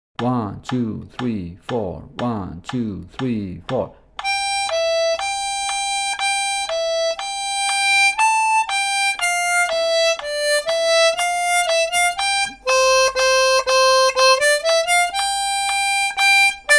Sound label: THIS OLD MAN 120, armonica